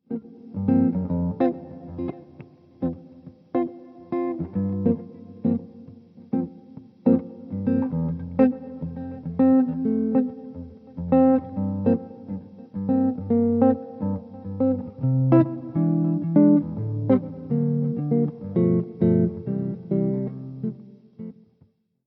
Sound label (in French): guitare